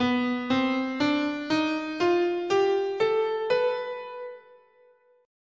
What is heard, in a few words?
B Altered, scale